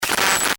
Scifi, Communication, Scramble, Glitch, Distortion; Science, Fiction; Communication Sound Effects